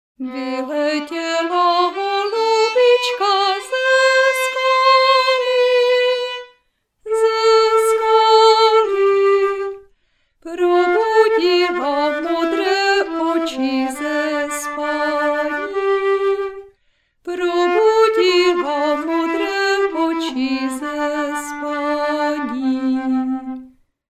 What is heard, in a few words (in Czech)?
Zensky Zpev Housle C1 Zensky Zpev Pricna Fletna C1